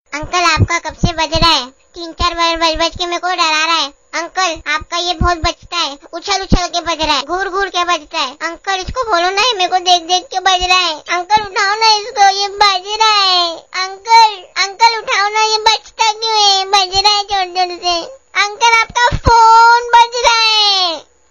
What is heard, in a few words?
funny children ringtone children